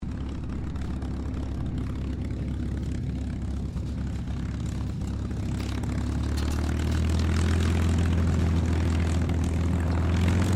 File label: AIRCRAFT CAC CA-18 MUSTANG MK23 TAXI SHUTDOWN STEREO, WW2 Mustang fighter plane; Airplanes